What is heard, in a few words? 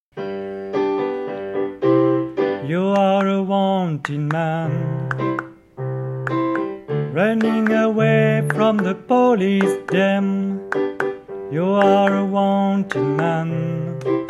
Alto